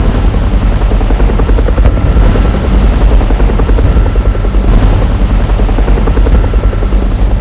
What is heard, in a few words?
heli